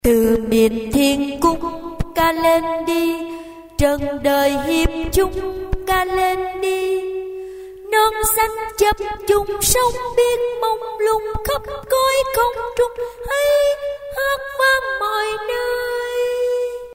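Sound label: soprano tenor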